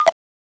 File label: Coin Pickup; FREE SFX PACK VOL 1